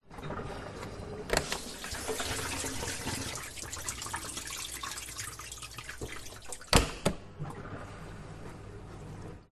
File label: household dishwasher operating water splash drip inside open door close resume; zapsplat